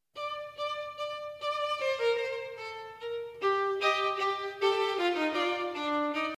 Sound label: violin